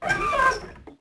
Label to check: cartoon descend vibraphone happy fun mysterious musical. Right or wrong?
Wrong